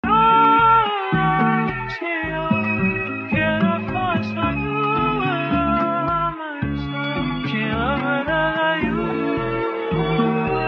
Funk slowed

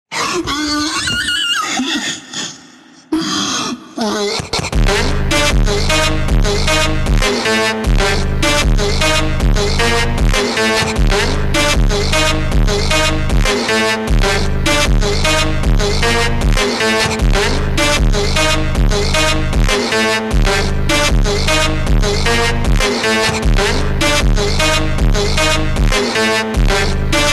Funk super Slowed

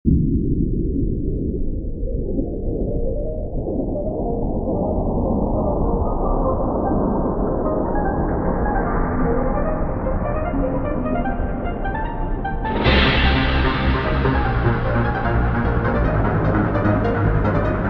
phonk Mix

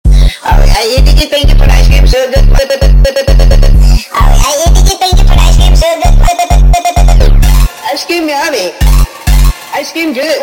Funk sped Up

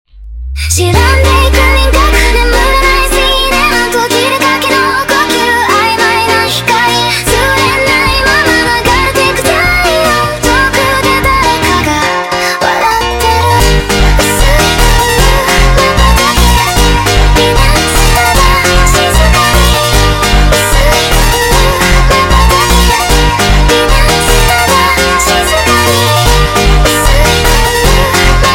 Montagem sped Up

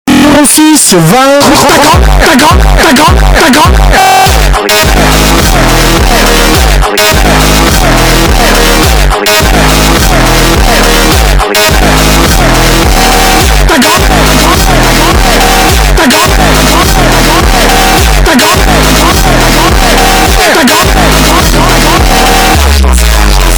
Funk

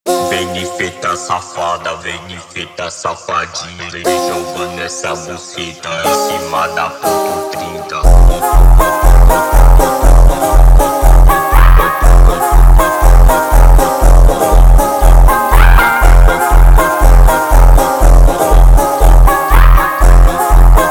Montagem slowed

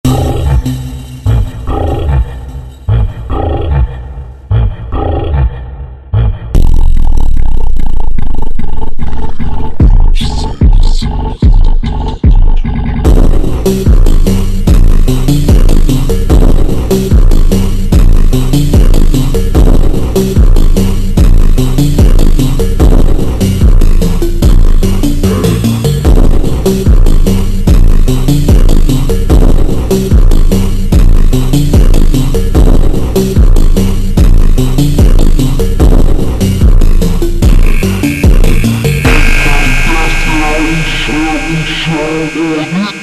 Funk super Slowed

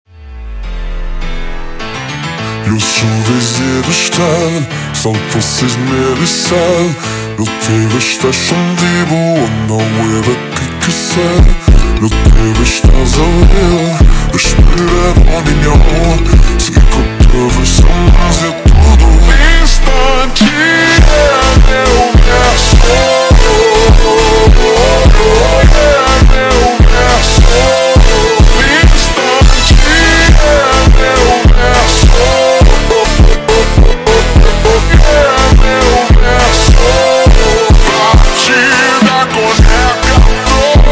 Batida super Slowed

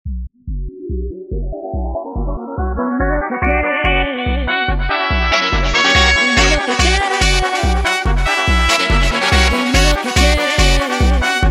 Funk sped Up

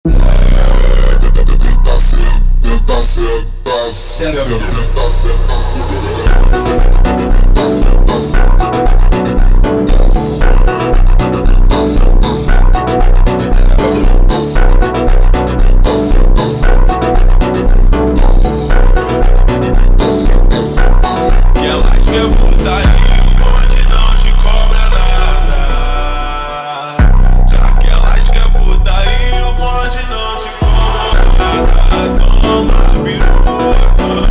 Funk slowed Reverb

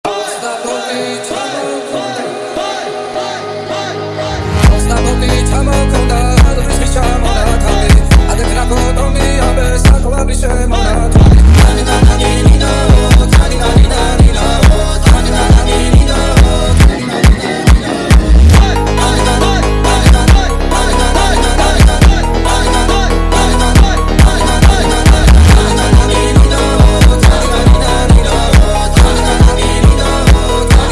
Funk speed Up, Georgian Folk

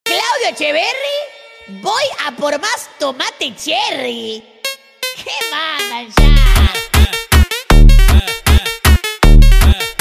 FUNK BRAZILIAN SPEED UP

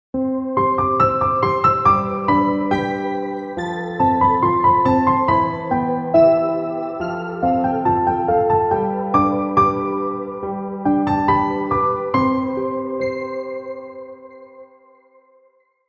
romantic ident

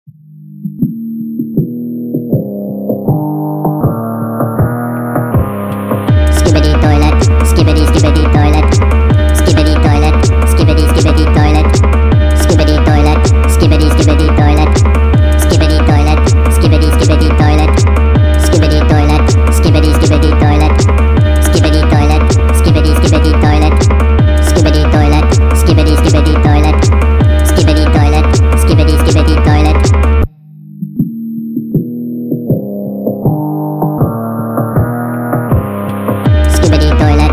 phonk sped up; Skibidi Toilet

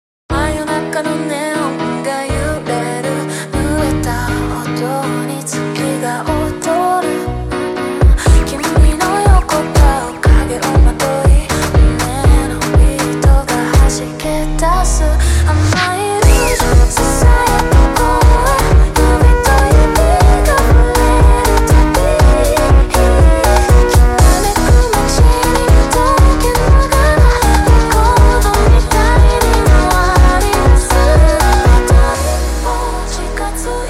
Montagem slowed

